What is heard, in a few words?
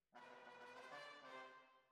Tuba, jazz for piano